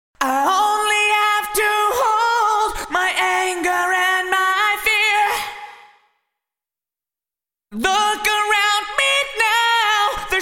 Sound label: Vocals Yamaha SPX2000 Rev X Wood Chamber; Vintage Digital